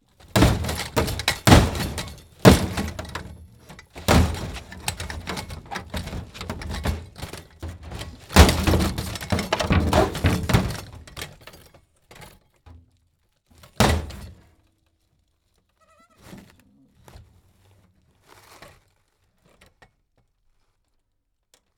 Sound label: Metal Smash Impacts